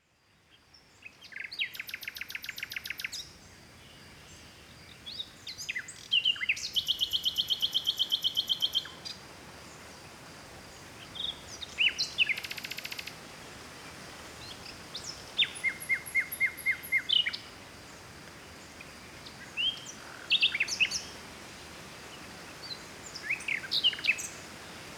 Nature Forest Birds Wind Early Morning Spring Distant Sea Mediterranean Grece; West Wolf; Seaside Nature And Waves